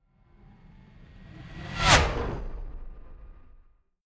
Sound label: fly by hover car hum high